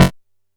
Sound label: F#2, Bass; E-MU Producer Series Vol. 6 – Analogia Project; Boom da Bass